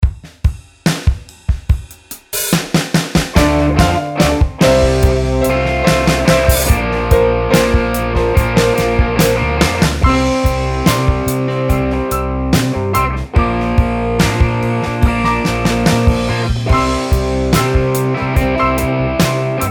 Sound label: backing